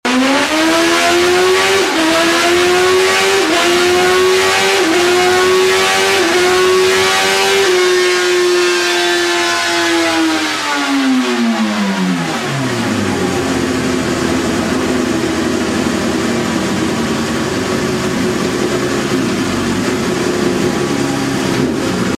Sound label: CBR1000RR slip on dyno run, exhaust sound; honda; Erion